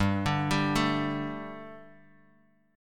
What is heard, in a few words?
G Suspended 2nd, Guitar, Standard; arpeggiated; 3,5,5,2,x,x